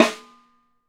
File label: tight, snares; Sampleheads - New York City Drumworks VOL-1